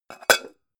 pan close hard

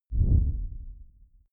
whoosh low distorted

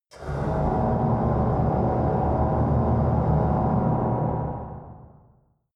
mechanic ambience dark

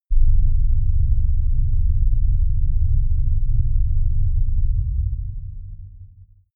rumble

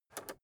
rotary control short turn soft

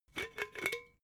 glass bottle massive open cap

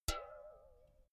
filled can hit ringing long

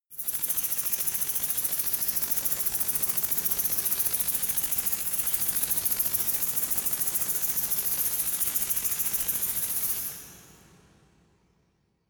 resonator rattle hard

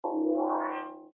harmonic event

